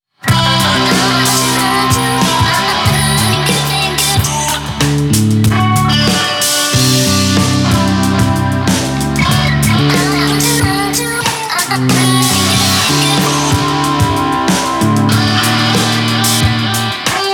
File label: Victory, jingles